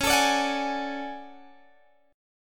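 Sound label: D Major Sharp 11th D flat, chord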